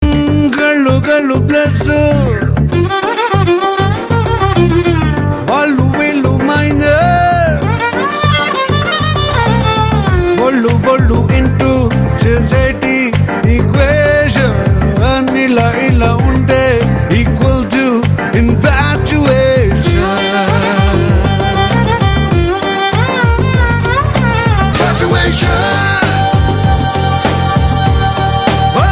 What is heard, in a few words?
Telugu Ringtones; Love